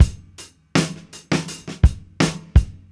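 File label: 82 bpm, beat loops; Zero-G; Monster Beats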